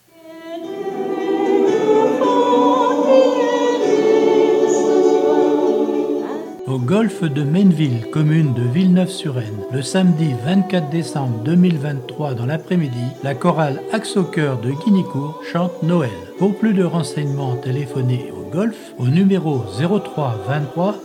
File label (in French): choeur chante Noel; golf de Menneville; Axo choeur